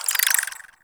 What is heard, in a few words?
Bird Clicky; Ambience; Warframe; Eidolon Plains